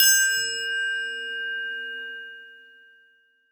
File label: triangle opn; Percussion; Sonatina Symphonic Orchestra